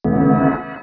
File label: alert